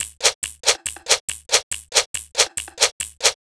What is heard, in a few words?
Loops 140 BPM Hihat Percussion Loops